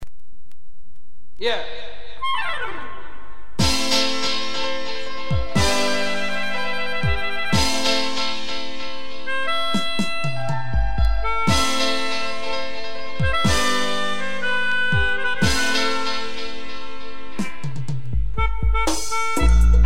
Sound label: melodica